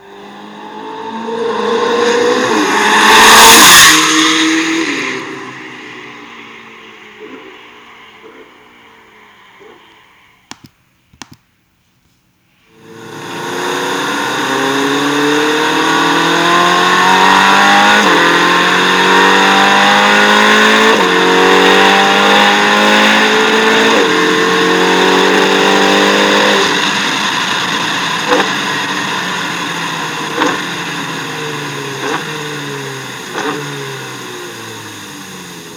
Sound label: 488 GTB, Ferrari